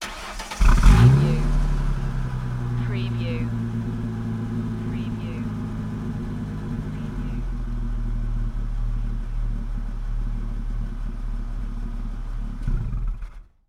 Mustang 68 Fastback Start Up Idle Shut Off Exhaust Perspective